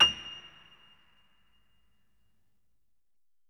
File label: Acoustic Piano; InVision Interactive; Miroslav Vitous